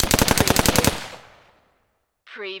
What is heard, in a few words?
Machine Gun Colt AR-15 Long Burst Medium Range